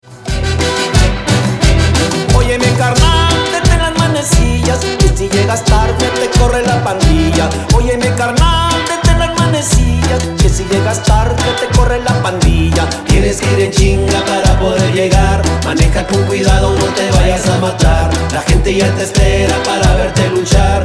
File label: Cumbia Volcadora